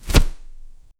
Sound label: natural paper flip